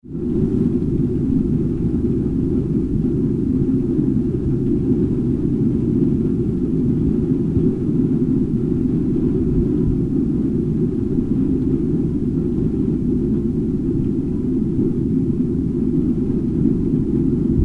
Jet Plane Ambience High Altitude Interior

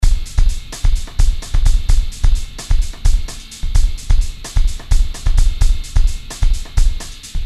Action Rhythm

